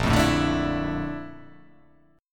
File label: A sharp Major Sharp 11th